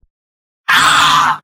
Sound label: pain severe; spy; tf; mvm